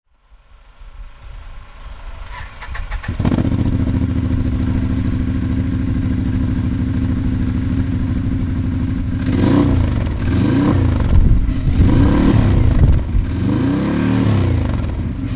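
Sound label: maverick half full system, exhausts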